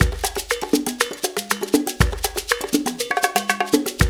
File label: LOOP, Percussion